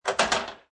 Billiard Pool Snooker Ball Delivery Mechanism In Table; Lynne Music